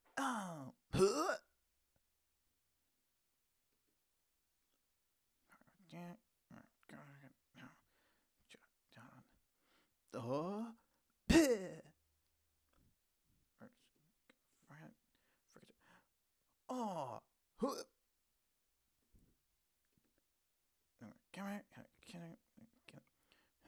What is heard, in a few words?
Comical Grunts And Mumbles